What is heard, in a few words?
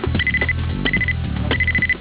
beeps